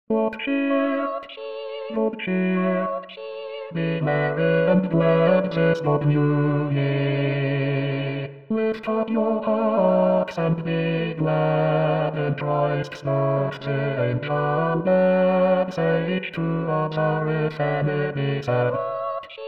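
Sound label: bass; Walton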